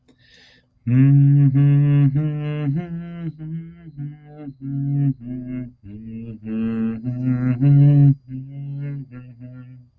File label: ode to joy humming; musiclm; melody conditioning